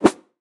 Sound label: throw; CrossCode; battle